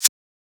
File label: Shaker